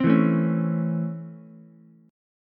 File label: Db Minor Major 7th Sharp 5th Db Ukulele; strummed